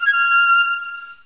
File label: pipe